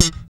POP E, Bass; Spectrasonics - Bass Legends CD 1; ALL THUMBS

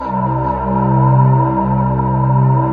DEEP BRTH, BREATHY; Synth

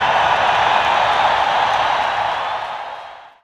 APPL, XP APPLAUSE

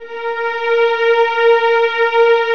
VIOLINS, Strings; ILIO

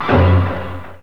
SYNCLV HIT, SYNCLAV HITS; Greytsounds VOL-1

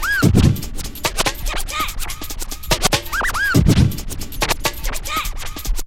SCRATCH STUTTER LOOPS; Megabass Remix! 2

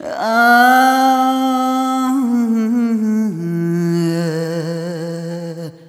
Arrhythmic F

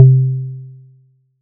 SY PLASMO; ModelCycles

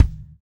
WD Kick, kicks; Roland BK-5

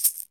shaker, percussion

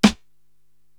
SD Dry Present, Korg TR-Rack drum machine

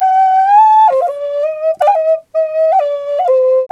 didgeridoo riffs

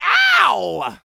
yell, male human, R&B dance vocal; Spectrasonics Vocal Planet

